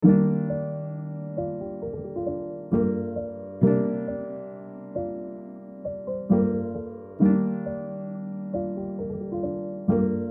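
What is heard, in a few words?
A minor 134 BPM Piano Keys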